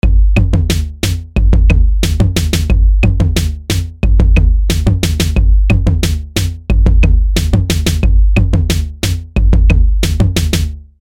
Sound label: 5 beat